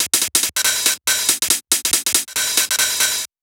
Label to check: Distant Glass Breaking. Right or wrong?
Wrong